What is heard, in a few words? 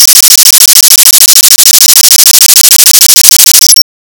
switch toggle